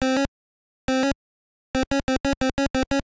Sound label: Enemy Mine Appearing, NES